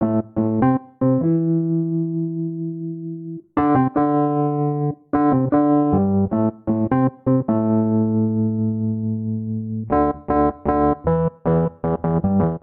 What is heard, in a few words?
Phrase Dub A Minor 76 bpm, Electric Keyboards; Ableton; Factory Packs